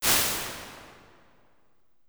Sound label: Big Drums; Large Spaces; Rooms; Impulse Responses; Apple